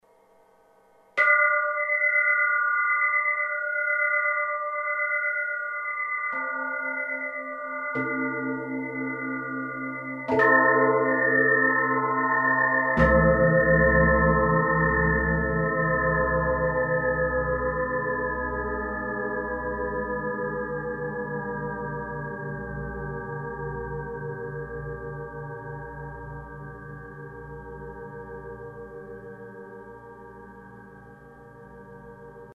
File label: Tibetan Bell